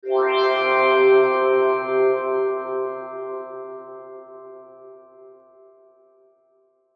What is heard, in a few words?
Logo Tinkle Ascend Tonal Swell; Sonic Logo Sound Effects; Production Element Imaging Element Accent Transition